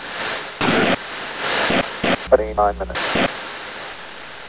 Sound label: tune